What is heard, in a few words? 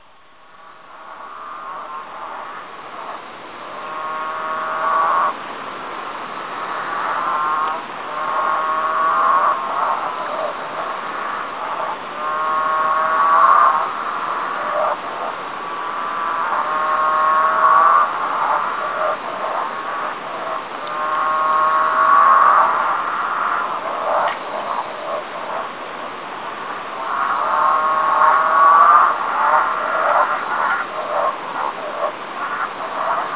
Frogs; oceania; australia